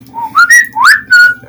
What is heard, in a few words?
tweet twitter